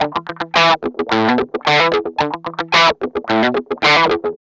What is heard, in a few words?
GUI, licks; 110 LICKS